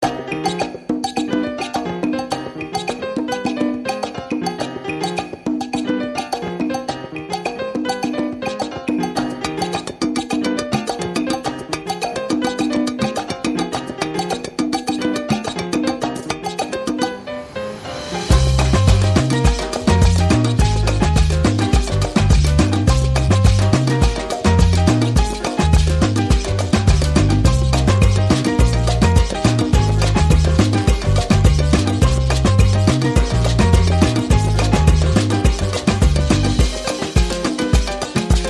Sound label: Percussion Bass and Piano